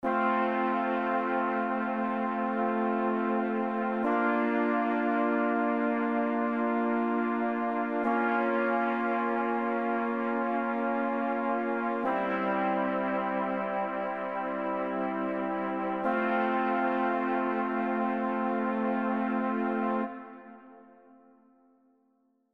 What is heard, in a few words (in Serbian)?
akordi limeni duvaci dur